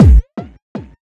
Subtractive Beat

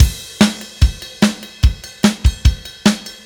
Silos Beat Crash, Indie Rock; Sonic Foundry (Sony Creative Software); Indie Rock Anthems; Session 11 - Silos

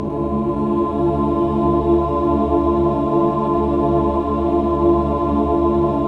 min chord, Symphony of Voices

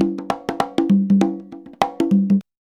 CONGA, Modern Percussion Loops

